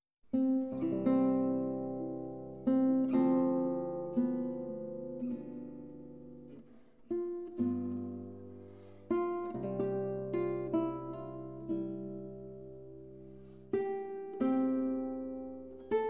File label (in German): Sarabande C-Dur, Gitarre; aus Sonata 25 S. L. Weiss